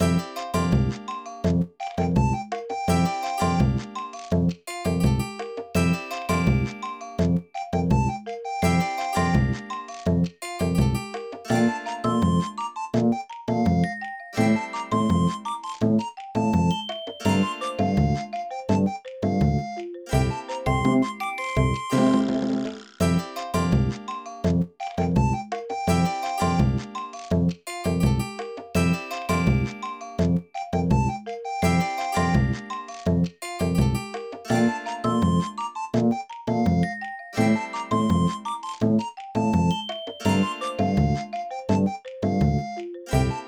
BGM Main Menu; kikiite hajimaru eigo kaiwa training kikitore 2008 nds gamerip

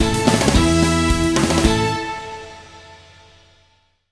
Level Complete Sting

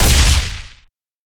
Sci-Fi Weapons-Sniper Rifle Single Shot

